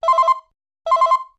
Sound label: Phone Ringer